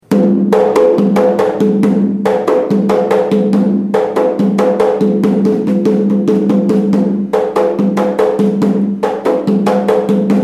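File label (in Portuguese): nago, atabaque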